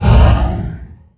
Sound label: invisible, bloodsucker